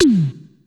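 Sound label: tom, Casio CTK-5000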